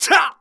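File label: Mon Man ATTACK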